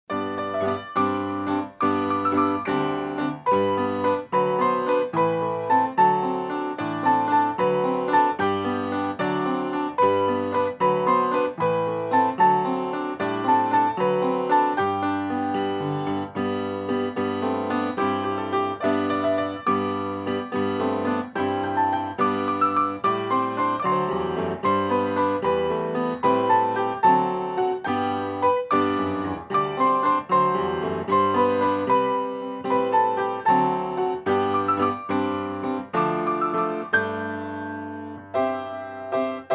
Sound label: Piano Duet